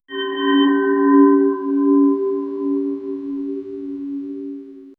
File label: deep shankh blast; sea breeze